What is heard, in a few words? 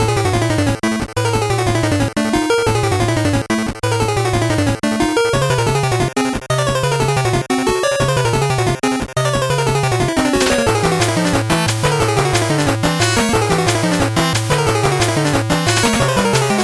8bit bgm slow